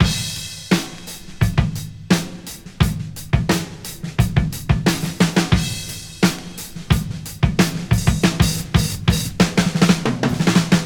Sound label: 88 bpm high quality breakbeat e key